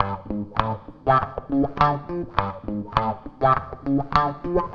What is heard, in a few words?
WAH, wah wah gtr